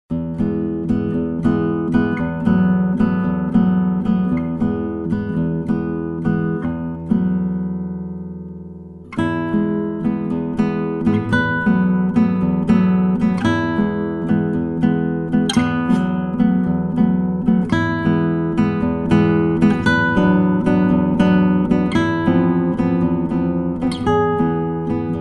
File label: Bossa